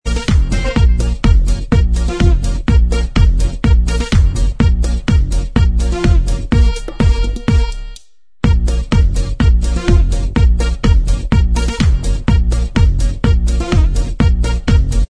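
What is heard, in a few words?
Instrumental Track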